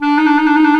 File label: THE CLARINET